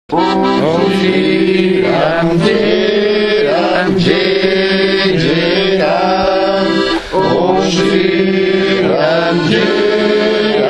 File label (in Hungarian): Rám mantra; emberi tökéletességről